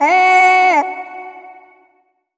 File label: modern, Voice